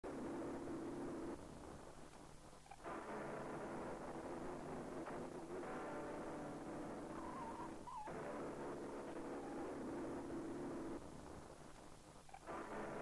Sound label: bell; 誘導ノイズ